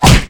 MONKEY BOXER attack

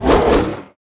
BLACKWOLF Attack; Eft

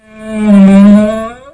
camel hungry

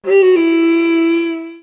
JAPANHEALER attack; Eft

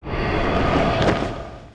Pirate Master ATTACK; Eft

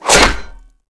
"Helmet1"

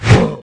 PirateChef HIT; Eft

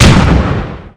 Attack; Eft

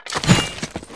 EMPERORCAVALRY ATTACK; Eft

